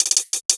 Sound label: hats oneshot rollup, Hat; Pop; Drums; Slate; SD PASADENA Pop Sample Pack